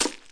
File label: Sound Hit Ice